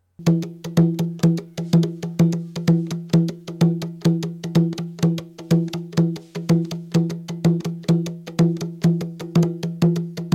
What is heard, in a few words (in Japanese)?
高音太鼓パターン; africa; 太鼓合奏曲; チヤンダ